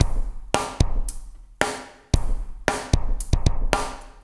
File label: DRM SWT; Spectrasonics; Liquid Grooves 1 & 2